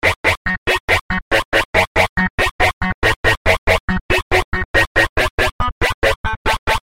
Message Tone

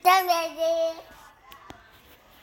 Text message

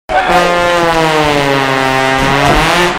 Trombone; WWE

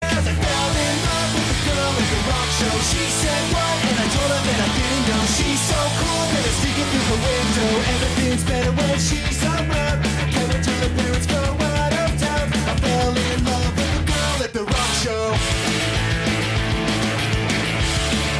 rock show

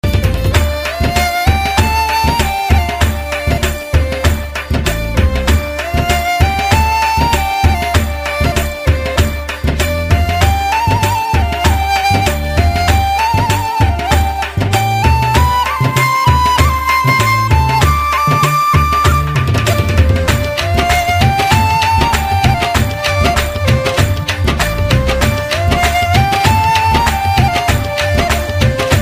South Indian Music

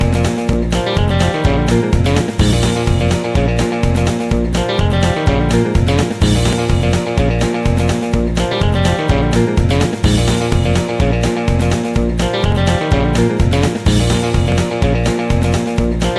Guitar

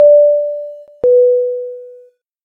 Passenger Chime 2 Dings

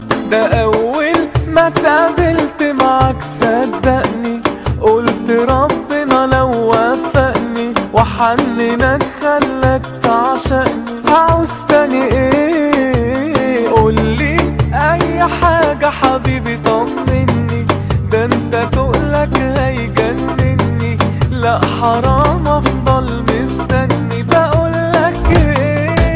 Arabic Love

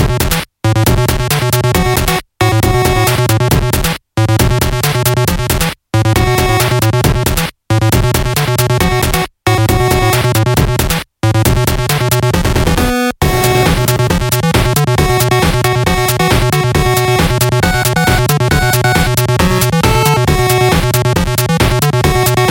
8Bit

